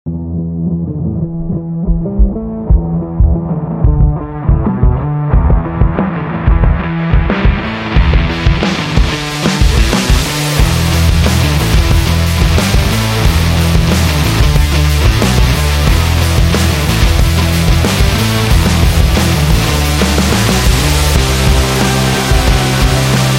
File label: Upbeat Uplifting Rock